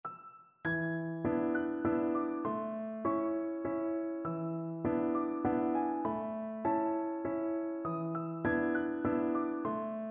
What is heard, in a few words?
waltzes, piano